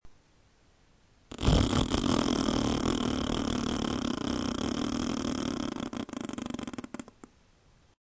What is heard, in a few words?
fart